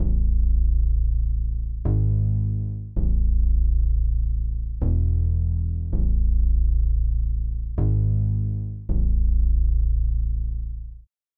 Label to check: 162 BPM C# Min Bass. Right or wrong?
Right